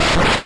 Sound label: Multi Attack